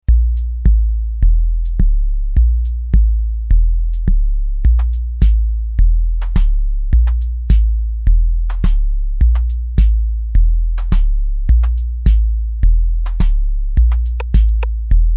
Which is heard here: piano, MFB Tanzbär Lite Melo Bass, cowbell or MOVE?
MFB Tanzbär Lite Melo Bass